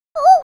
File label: crossbills toop